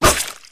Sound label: knife slash sound effect